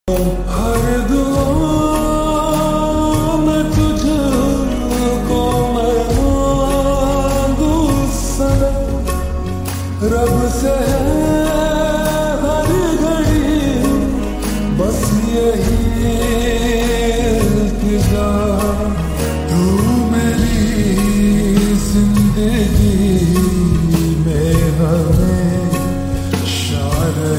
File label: Slowed Reverb Love Ringtone